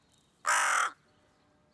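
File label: hooded crow corvus cornix cornix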